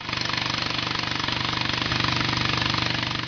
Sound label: nautical